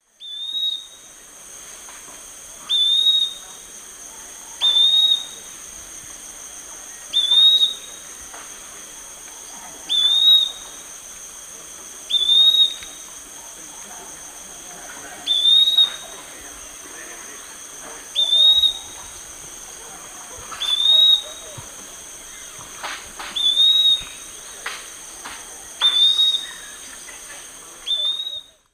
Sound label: Contopus virens, calls; S. America; Eastern Lowlands